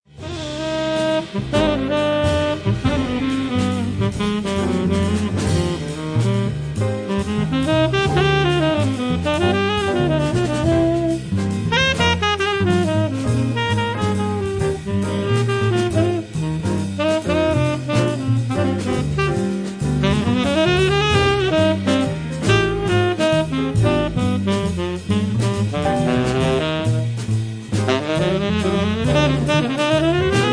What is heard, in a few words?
romantic